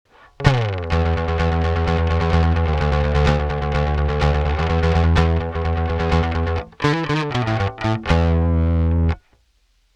Walrus Audio Badwater Drive EQ Drive Blend HF Compressor